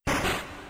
enter; P3D-Legacy

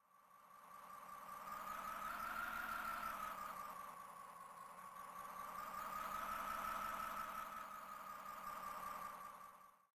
wind; P3D-Legacy

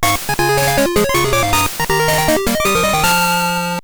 badge acquired; P3D-Legacy

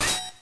ice crack; P3D-Legacy; 2.5DHero

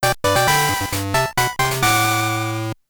Receive HM; P3D-Legacy

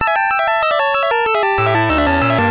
raikou battle intro; P3D-Legacy

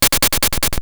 Field Move Fly; P3D-Legacy

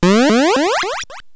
teleport; P3D-Legacy

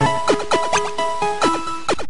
heal; pokemon; P3D-Legacy; 2.5DHero

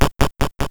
Warp Ladder; P3D-Legacy

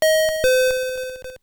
Elevator End; P3D-Legacy

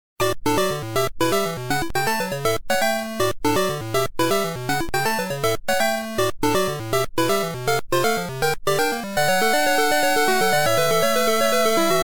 trainer defeat; P3D-Legacy; 2.5DHero

